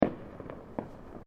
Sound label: Fireworks Single Firecracker